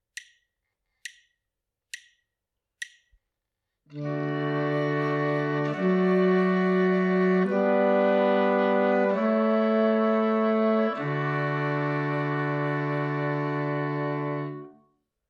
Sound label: harmonic error detection; ear training